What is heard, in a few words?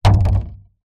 plastic fruit tub packet down on surface; foley